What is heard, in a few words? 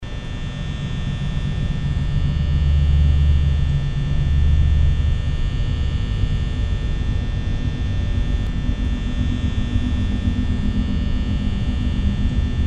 Main Ambience